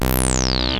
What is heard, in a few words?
BUZZ 303, ROLAND TB-303 BASS; Rubber Chicken Software Sample Bank